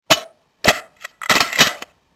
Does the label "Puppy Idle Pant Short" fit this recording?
No